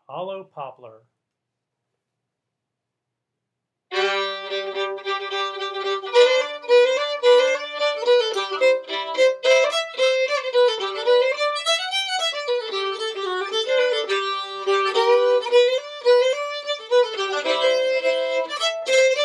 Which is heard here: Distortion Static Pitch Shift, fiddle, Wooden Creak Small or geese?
fiddle